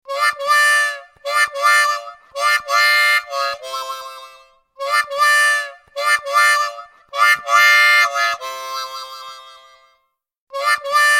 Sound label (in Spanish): Harmonica, tonos de llamada; LG